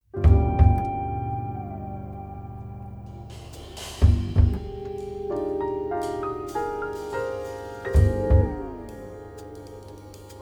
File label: trio